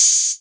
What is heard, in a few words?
OPEN HAT, one shot drums; App Inventor Media